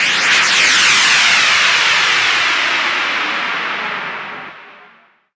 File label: NOISE, Production Effects